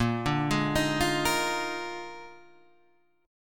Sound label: Bb 11th, Guitar, Drop D